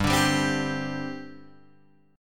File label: G Suspended 4th, Guitar, Open G